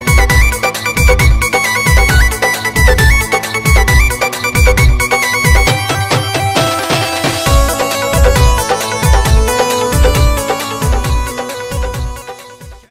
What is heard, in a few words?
Dhol Music